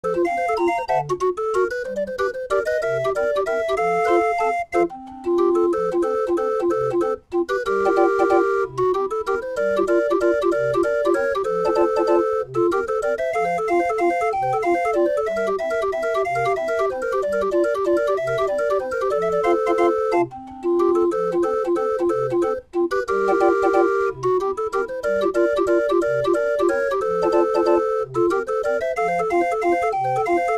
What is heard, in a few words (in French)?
Orgue de Barbarie